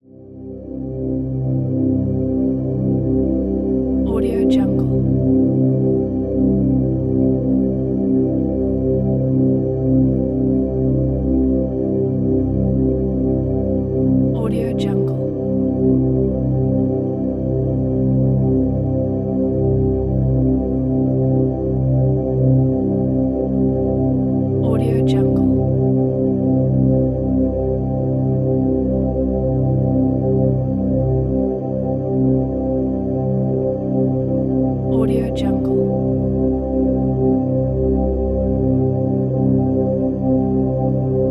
ambient drone pad

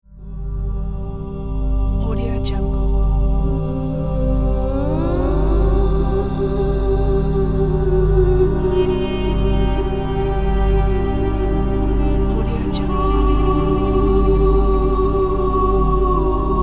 ambient ramadan eastern background; music2noise

